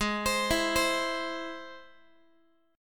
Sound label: G sharp Augmented G sharp Guitar; arpeggiated; x,16,15,14,16,x